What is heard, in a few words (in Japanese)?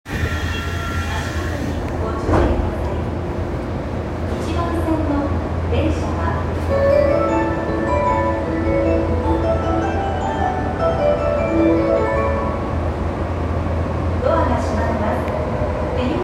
日比谷線三ノ輪南, train sounds